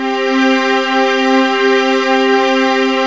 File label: digi strings, synth strings; Octamed 6; walkabout